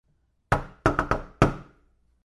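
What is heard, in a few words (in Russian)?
звуки дверей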